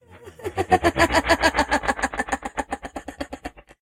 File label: laughs; Nemira; SyrinScape; Iris hill manor